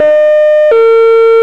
australia ambulance siren; gmod; svmod

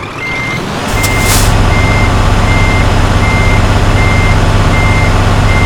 vehicle reverse; pierce arrow xt

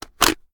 reload empty mag out; reloads; gmod; iw8

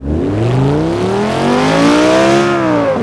first, vehicles; gmod; tdmcars; gallardo

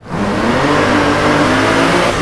first, vehicles; bmw 340i

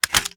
reload fast drum drum out, reloads; gmod

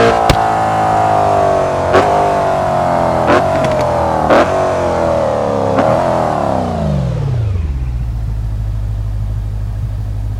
throttle off, vehicles; kuruma

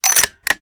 reload empty load; reloads; gmod

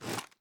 pi reload cloth; gmod

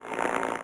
radio noise; vehicles radio; gmod

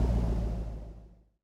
stop, vehicles; 15gt

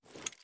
inspect; gmod

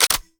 reload empty bolt open; reloads; gmod; iw8

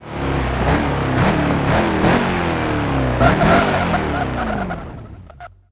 throttle off, vehicles; gmod; tdmcars; sls amg

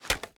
reload empty fast end, weapons; gmod; viper

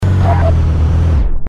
stop, vehicles

